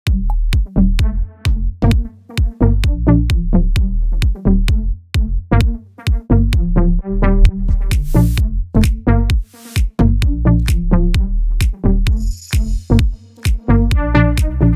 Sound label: house